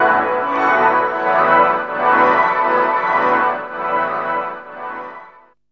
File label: DR. LOOP, TRANCE; X-Static Goldmine 3